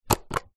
household vacuum cleaner plastic power button press